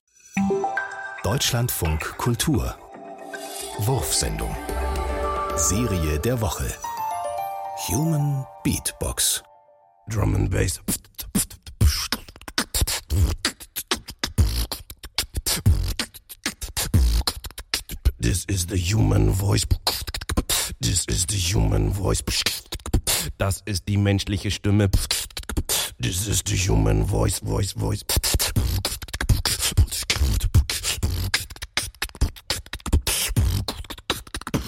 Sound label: human beat box drum n bass; dradio; wurfsendung